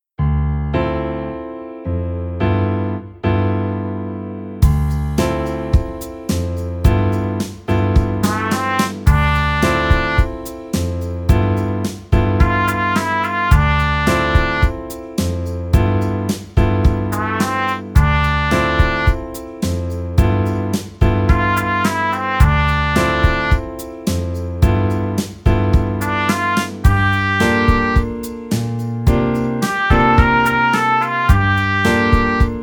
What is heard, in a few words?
Trumpet; easy beat vol 1 trompete